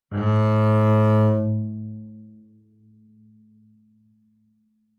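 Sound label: Bass arco fortissimo sul G A2, Double Bass; MIS Pitches - 2014; Strings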